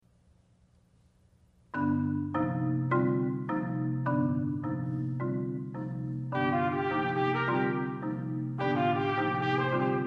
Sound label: Tpt Sonata